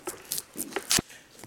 Klebestreifen

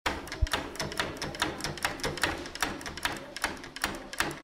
naehmaschine

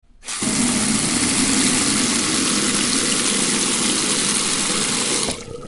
wasserhahn

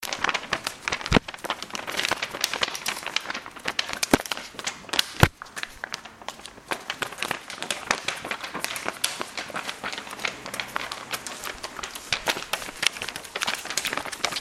papierfecher

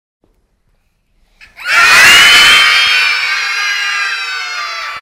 kinderalarm

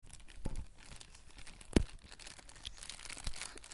feuer

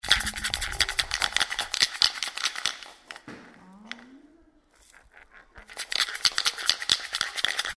rassel

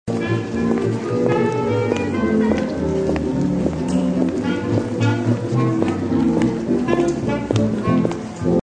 spazieren gehn in der innenstadt

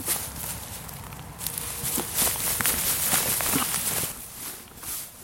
laufen durch stroh

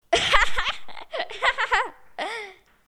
kinder lachen

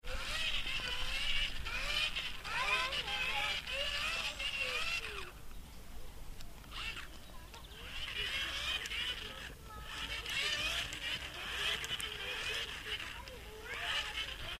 kurbeltaschenlampe

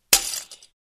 fenster wird zerschlagen

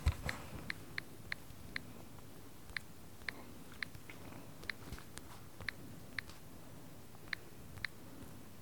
Handytippen

